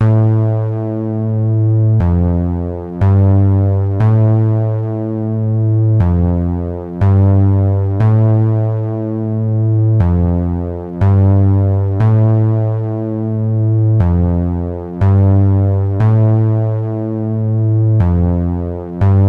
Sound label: bass